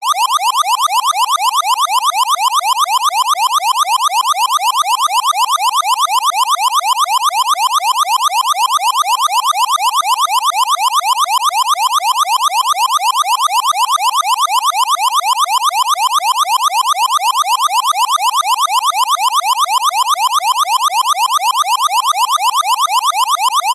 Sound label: emergency alarm siren